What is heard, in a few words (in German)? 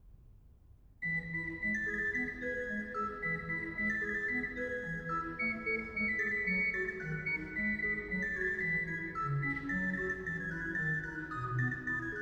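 Klavierstück in F major; Mozart; Keyboard Works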